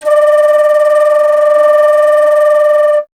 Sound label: Alto Flute